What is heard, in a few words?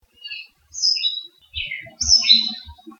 ambiance birds; Camas